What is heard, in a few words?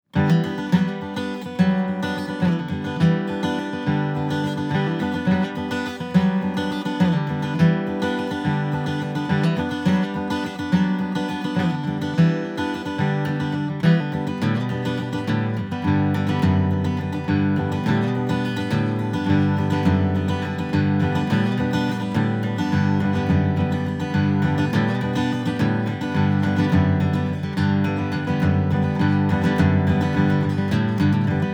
horn